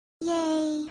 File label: shy yay